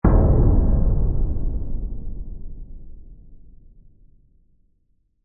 Hit Phase; Hits; Sound Design; BlastWave

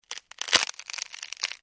impact wood snap break; Impact - Wood ( Small ) Snapping / Breaking; Drops; Objects; McKinneySound

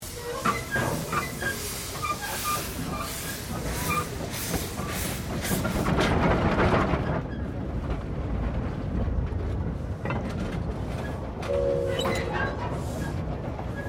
Steamtrain Trucks Rolling; Bellarine Tourist railway vehicles; Trains

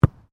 RUGBY BALL BOUNCE; Various rugby sounds; Miscellaneous Sports (Sound Effects:Sports)

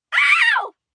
Pain Shriek Ow, Ow, Ouch, 1 child, short scream

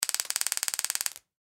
Police Stun Gun, Stun Gun Post Shot Re-Stun; Emergency

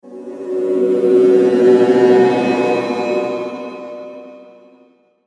Whoosh Musical Robotic; Blastwave

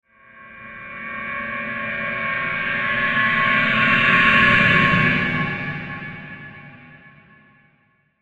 Ascend Swell Reverse Cymbal; Blastwave

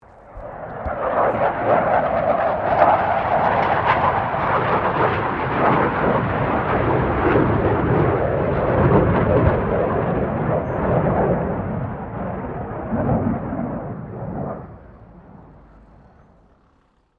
AIRCRAFT FA-18 HORNET PASS DISTANT

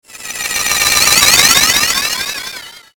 Ascend Chopper Stutter Swell Warble; Blastwave

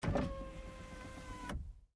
GMC Savana, Power Window, Up, Truck; Vehicles; 2010 GMC Savana; BigRoomSound

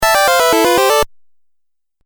Musical Phrase Video Game; Musical Sound Effects

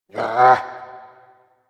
Martial Arts Yell "Yaarh"; Combat Voice Sound Effects

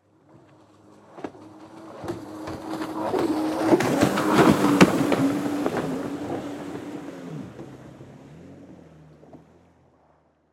Jet Ski Pass By, Watercraft, Fast, Speed; Transportation; Boat Sound Effects

